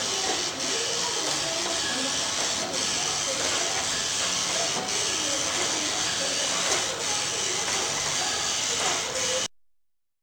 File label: high